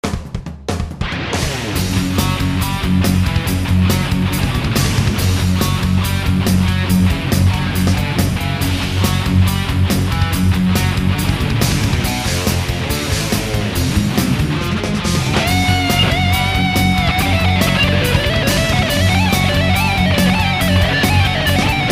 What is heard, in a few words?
GT-8 dist